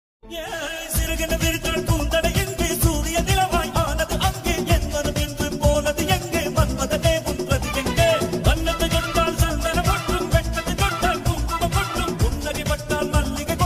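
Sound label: song background music ringtones, Tamil background music ringtones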